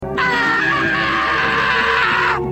Scream; Courage

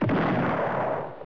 pow; Loony Tunes

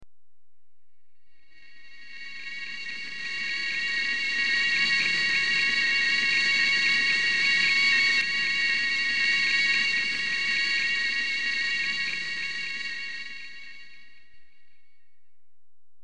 Sine Sound, Ringtones; Outer Limits

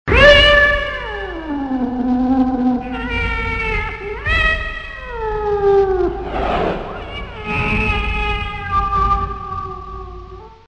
warning, Cats

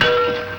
clank; Three Stooges

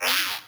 chirp; Star Wars

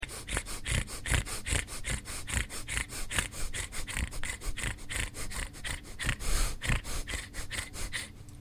pug pant

